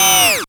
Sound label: f#, Rave FX, Dance Synths